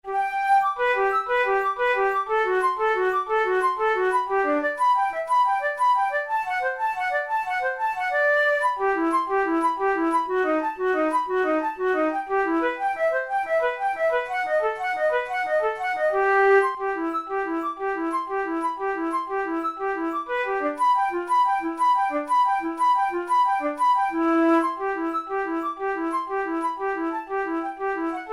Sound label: studies for the boehm flute; drouet 72